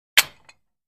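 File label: Gym Weight Equipment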